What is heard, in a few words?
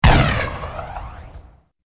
single gun shot